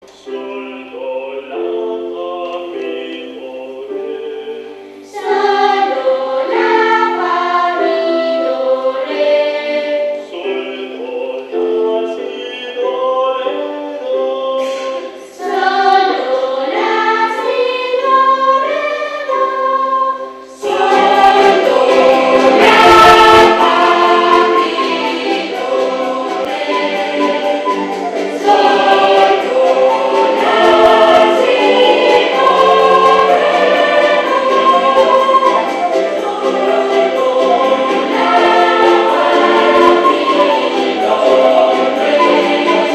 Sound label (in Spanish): Do re mi voces blancas